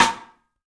MARFSN, 3D Drums, X STICK; 3D Sound

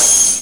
Heartbeat; SNES; Appleseed - Prometheus no Shintaku

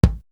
Kick, Drum One Shots; AMG - Coldcut's Kleptomania VOL-1

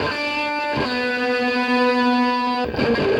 Krush, Electric Guitar

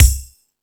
Thundadome, E-MU Pro-Cussion; drum machine

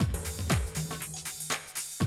Classic Drum Loops

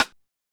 SQTM, Sequential Tom

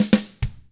ROCK, Drums; ILIO; Double Platinum Drums 1

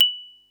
mallets

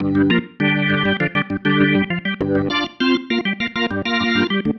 Pattern, Organ

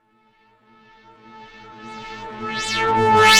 Danner Swell; KJ Sawka - Drum n Bass & Beyond; Swells

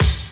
Dance Mega Drums, KIT D+B; Best Service

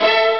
D50 arco; ST-XX Amiga Soundtracker Sample Packs

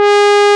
C 4 SQAR, ARP, OLD SYNTHS; Rubber Chicken Software Sample Bank

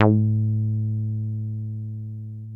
303 A2, TB 303, Synthesizer; Hollywood Edge; Giorgio Moroder Rare Synthesizer Collection

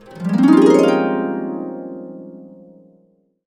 Complete Harps; USB Soundscan vol.62; AKAI

